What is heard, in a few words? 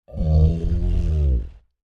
Creature Large Moan Exhausted; Monsters; Horror; Big Room Sound